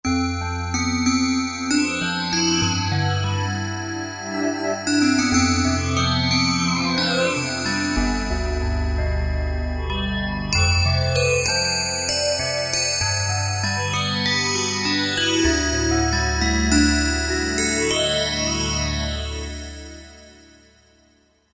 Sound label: Fantasy Bell, Roland JD-990; Factory Patches